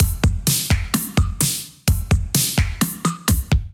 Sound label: Young Blood Kit 128 bpm, Drums; MIDI Clips; Drive and Glow; Ableton; Factory Packs